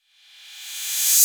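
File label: 808 cymbal reversed; Wizoo; Platinum 24 Electronic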